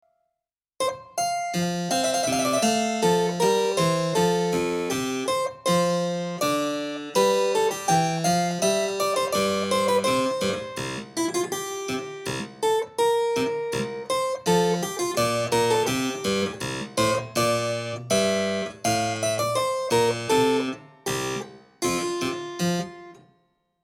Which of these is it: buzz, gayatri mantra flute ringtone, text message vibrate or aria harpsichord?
aria harpsichord